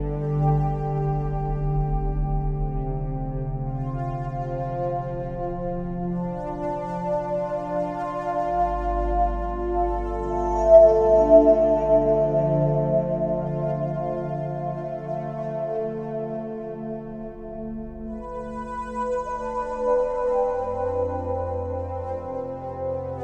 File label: Pure Ambience; Sonic Foundry (Sony Creative Software); Numina II